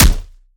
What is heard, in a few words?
melee character fist; viper